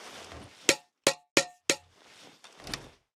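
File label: Weapon flamer jam; Wpn flamer jam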